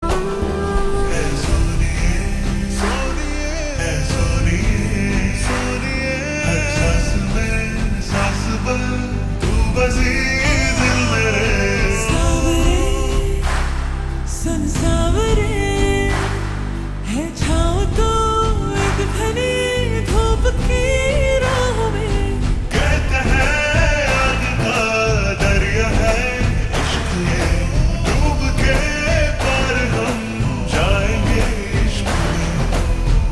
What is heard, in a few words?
Slow Reverb Version Ringtone